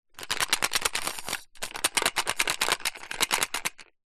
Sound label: food candy sweets pastilles gums tin small shake lid on